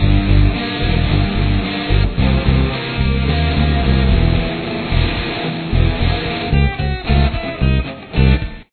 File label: chorus, guitar